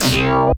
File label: SYN, 170 BPM, Drum & Bass; Ueberschall; Drum & Bass Flash Trackz